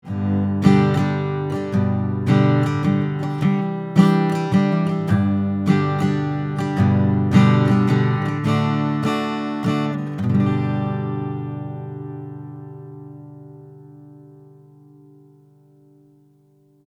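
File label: Acoustic Guitar Strumming; Extinct Audio BlackOps; 30cm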